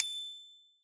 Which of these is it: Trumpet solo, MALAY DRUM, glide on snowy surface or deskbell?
deskbell